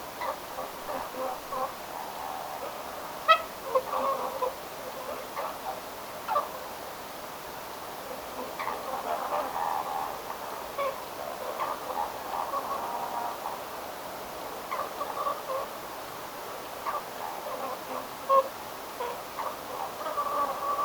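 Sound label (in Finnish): haarapääskysen pesäpaikan laulujoutsenpesueen sosiaalisia lähiääniä, perheen lähiääntelyä, poikasten ääniä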